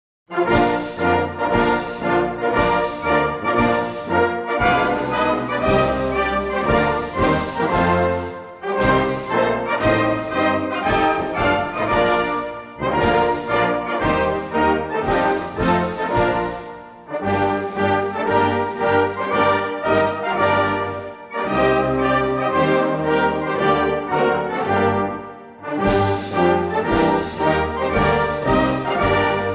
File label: anthem syria